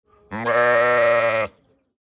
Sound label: baran bee; Звуки, которые издают овцы и бараны; Звуки, которые издают овцы и бараны в нескольких вариантах